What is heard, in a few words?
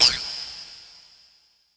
SHORT, SHORTNOIS; AKAI; USB Soundscan vol.14 - Special FX Twisted